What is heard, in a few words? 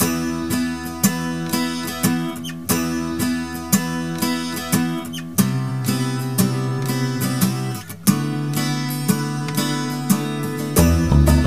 magic guitar